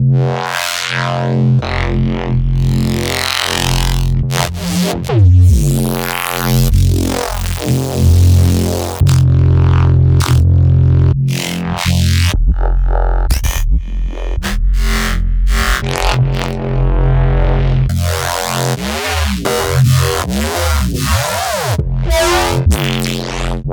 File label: Reese Basses; Antidote